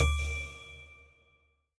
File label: Win attention; alohaxmas